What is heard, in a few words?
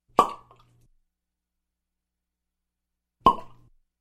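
cork shot "chpok"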